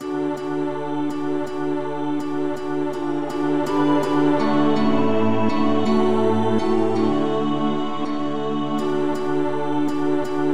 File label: polyinstru; hymns; Himnario grande